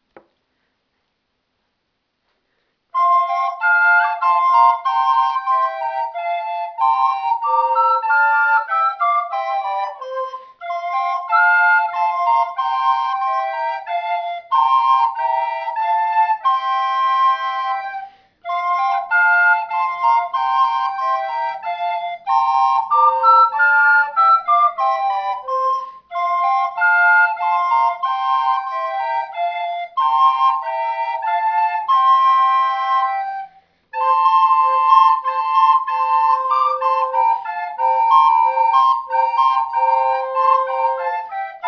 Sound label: menuett; spielfolk